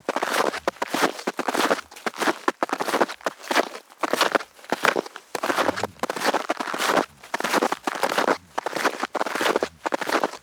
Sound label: footstep snowshoe walking fast; Almost Maine